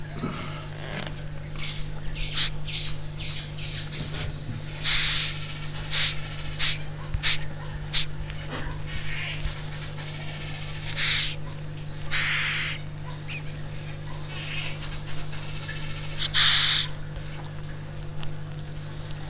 ujjnyomásra sziszeg, madagaszkári bütykös csótány; szegedi zoo 2011

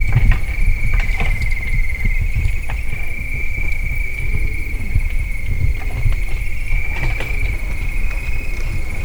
a vadászles alatt szarvastehén neszez; termeszetben; allathangok; kozepcsala

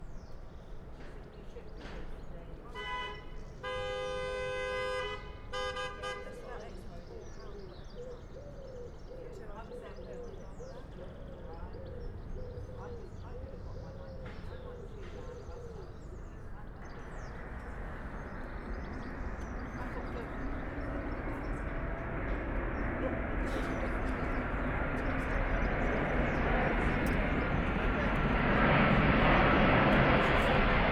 zajos leszálló gép dudáló autó

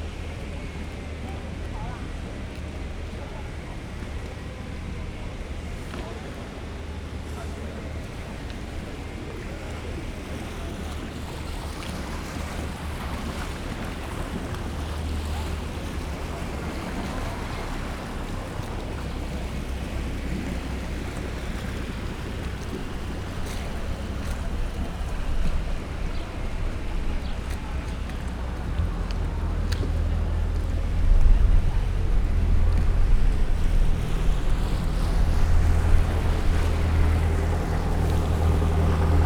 ket savos csatorna, harom vizi jarmu, laguna; olaszorszag velence 2014

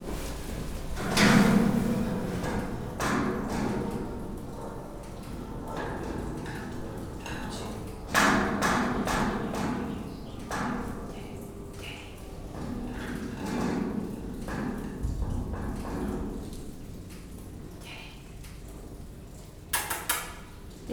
lusta oroszlánok nem mozdulnak; pecsi zoo 2017

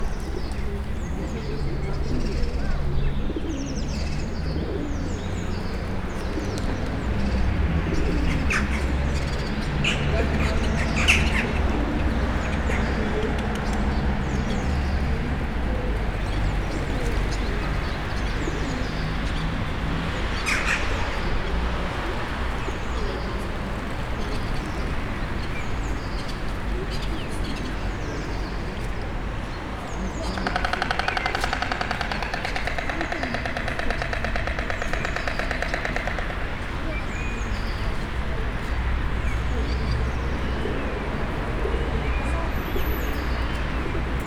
csókák, kelepelő gólya, Magas Atlasz

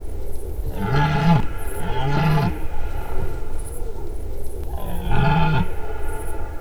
közelről, gímszarvas; termeszetben

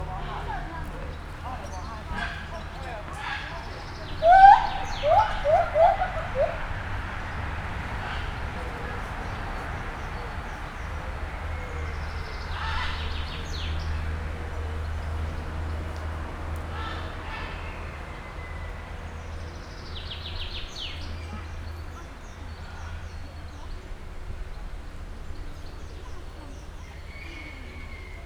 hatterben autoforgalom, feherkezu gibbon; nyiregyhazi zoo 2019

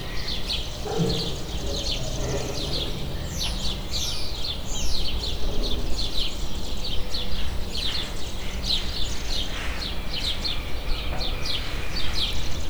halkan mordul egyet a házában, erős verébcsipogás, oroszlán; debreceni zoo 2012

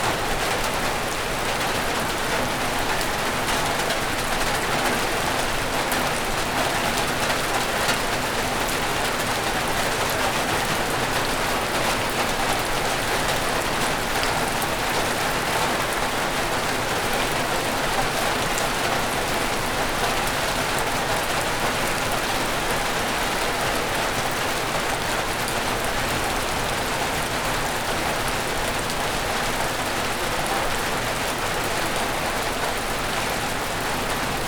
heves eső

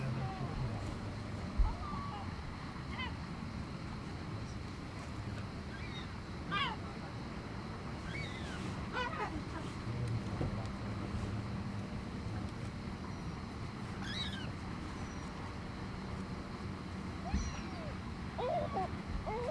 közeli hangok a hajóról, sárgalábú sirály, thassos; allathangok; termeszetben; marantz

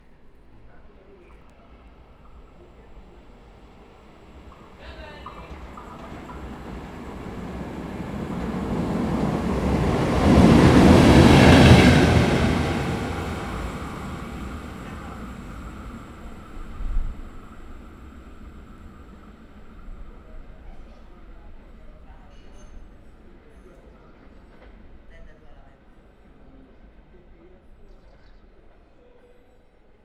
elhalado mozdony

